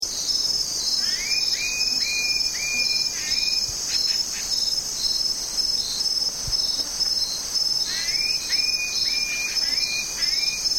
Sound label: Carpintero Copete Pajizo, Pale-crested Woodpecker, Celeus lugubris; cantos